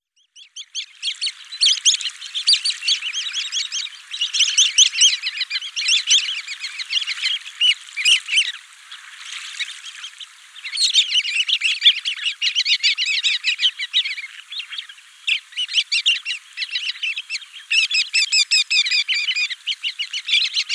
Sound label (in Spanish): correlimos tridactilo